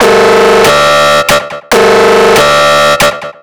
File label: ELECTRO SYNTH 140 A